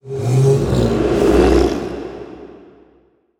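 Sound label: Mana Reservoir Play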